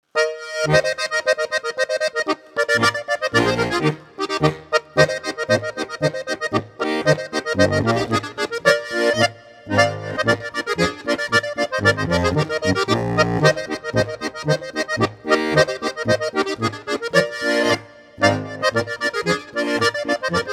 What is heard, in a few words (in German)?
2 Chörig Flach B Es As Des